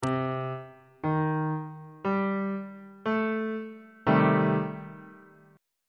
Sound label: b sharp minor 6th chord on bass clef